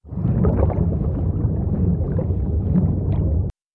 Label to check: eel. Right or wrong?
Right